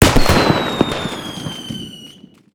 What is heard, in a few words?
rabbit Explosion; MUDevs S20.1-3